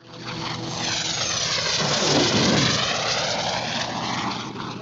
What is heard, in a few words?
airplane, Vehicles